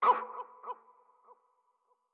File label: Hurt; StyxMech